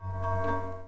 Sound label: ballet exclam